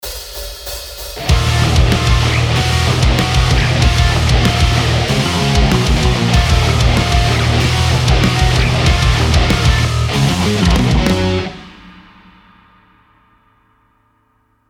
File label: Riffs